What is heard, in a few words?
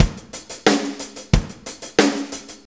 90 ROCK, DRUMS